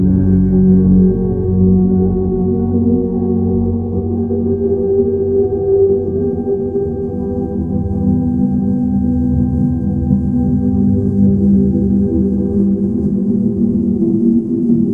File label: ambient soundscape, ringtones; ai